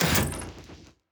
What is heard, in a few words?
Ui Power switch off